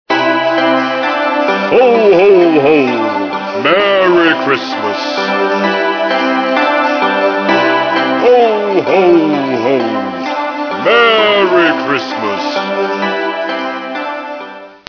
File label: Funny Merry Christmas Ringtone; ringtones